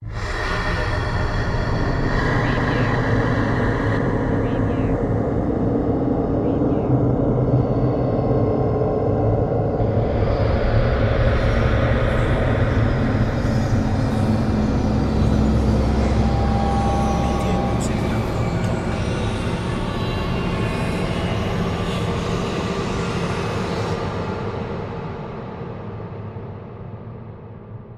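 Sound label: Production Element Bed Demonic; Sound Effects Bible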